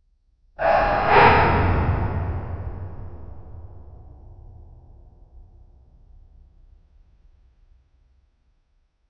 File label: a dungeon entrance sound